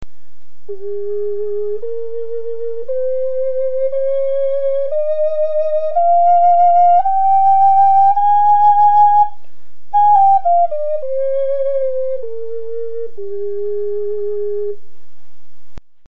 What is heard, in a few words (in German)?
tonfloete gross